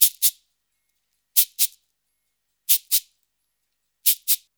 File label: 089 FREES, Modern Percussion Loops